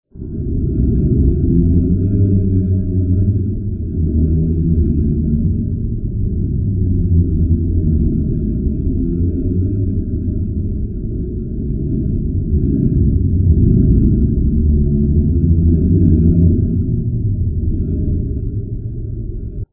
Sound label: arenal tremor 100x rev; benjamin wright